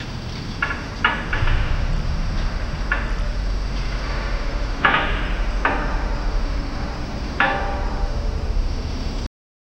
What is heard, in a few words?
subtle distant building